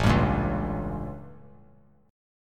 A 7th Flat 9th; A7b9 strummed; JGuitar

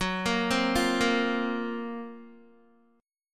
F sharp Major 7th Flat 5th, Guitar; arpeggiated; JGuitar

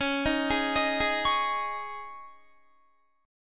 C sharp Suspended 2nd C sharp Guitar, chord; C#sus2; arpeggiated; 21,18,18,20,20,20; 4E,4A,5D,5G,5C,6F

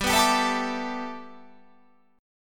G 6th Add 9th

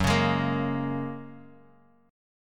Gb Major Add 9th Gb, Guitar Drop D; chordshape; down

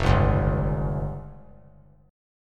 F Suspended 2nd Sharp 5th F down; Fsus2#5 strummed; JGuitar

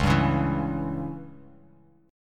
C sharp Diminished C sharp, Bass Standard; strummed; chord; 9,10,11,9; JGuitar

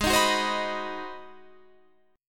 Ab 7th Sharp 9th, chord, guitar

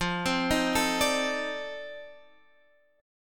F 13th, Guitar Standard; F13 arpeggiated

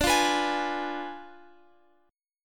D Diminished 7th D, Guitar, Open F; Ddim7 strummed; x,x,14,12,14,15

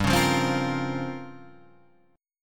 Bb Minor 6th Add 9th, guitar

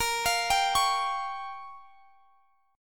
A sharp Minor 6th A sharp

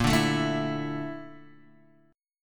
A sharp Major Sharp 11th, Guitar, Open D

